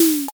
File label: drawer